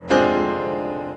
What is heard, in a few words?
chord